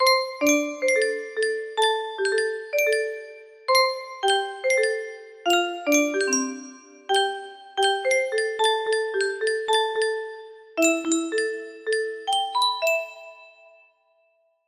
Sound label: march, music box melody; fnaf